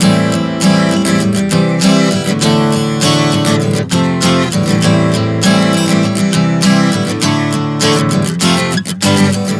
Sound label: Progression 100 Am-C-D-F F-G, Acoustic Guitar, Chord Progressions 100 bpm